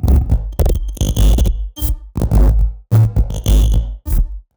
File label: F-D Distorted Bass Tone (Rythm); neuro; BIG5AUDIO